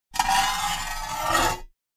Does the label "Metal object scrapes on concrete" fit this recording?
Yes